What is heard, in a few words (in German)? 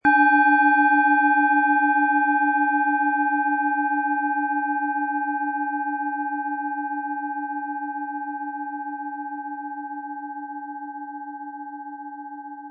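Planetenschale Mars mit Klöppel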